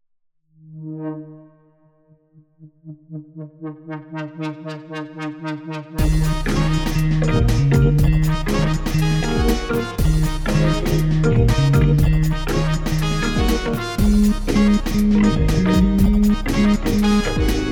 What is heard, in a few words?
MIDI blues